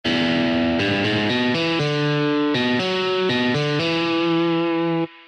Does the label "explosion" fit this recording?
No